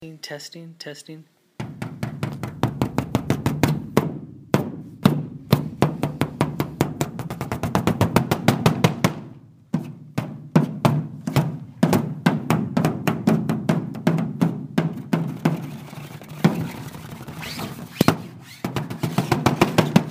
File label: Hammock Timpani